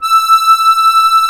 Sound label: DIATONISC, AKKORDEON; Best Service; Alpine Volksmusik